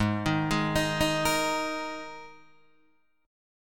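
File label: Ab Suspended 2nd chord, Guitar, Open D tuning; 6,6,6,9,6,8; down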